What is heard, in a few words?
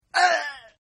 Grunt; Partners In Rhyme